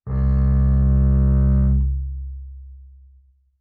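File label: Bass arco fortissimo sul E D2, Double Bass; MIS Pitches - 2014; Strings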